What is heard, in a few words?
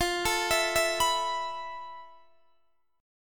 F Major Sharp 11th, Guitar, Open D; down; chord shape